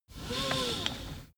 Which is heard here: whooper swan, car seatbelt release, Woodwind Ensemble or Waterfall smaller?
car seatbelt release